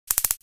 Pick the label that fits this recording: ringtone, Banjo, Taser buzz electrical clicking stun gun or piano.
Taser buzz electrical clicking stun gun